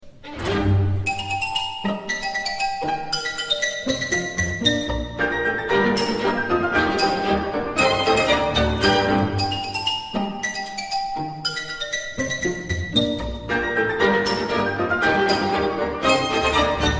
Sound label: Xylophone; Carnival of the Animals Fossils